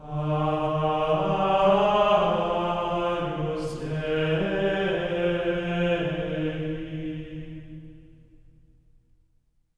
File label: AGNUS D#4, Symphony of Voices; Spectrasonics; GR AGNUS